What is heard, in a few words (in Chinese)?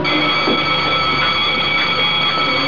铃声; 日常生活; 特酷声音素材库